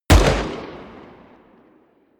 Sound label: revolver distant shot; schusswaffen